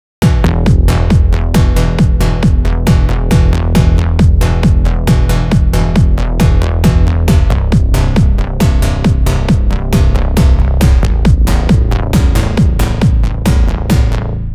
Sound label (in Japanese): ベースの打ち込み